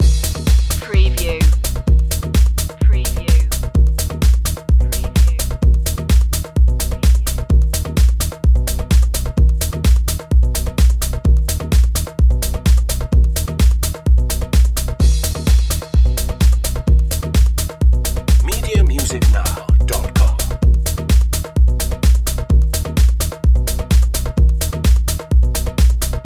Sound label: iron move loop